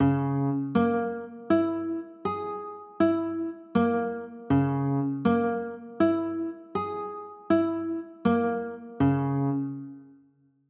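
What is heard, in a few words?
G flat 6 chord, guitar; g flat 9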